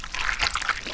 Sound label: Blood Splatter Sound